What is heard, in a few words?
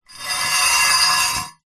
industrial metal heavy gate post pounder scrape concrete ground